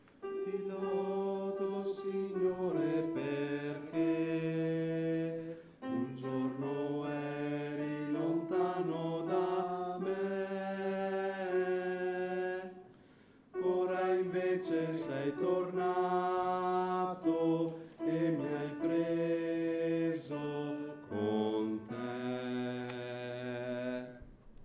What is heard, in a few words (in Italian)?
voce